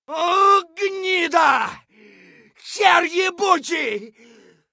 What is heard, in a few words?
hurt light; Escape from Tarkov